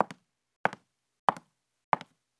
chuze drevo; Mafie 1 cz

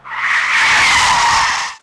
smykovani; Mafie 1

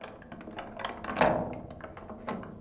trezor odemceni; Mafie 1

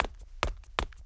beh; Mafie 1

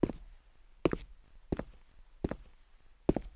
kroky; Mafie 1

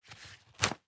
obvaz; Mafie 1

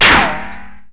kulka; Mafie 1

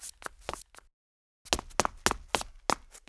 beh; Mafie 1

